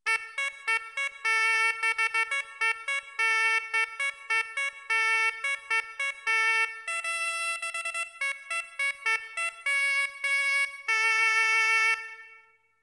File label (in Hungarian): Huszar trombitaindulo; hungary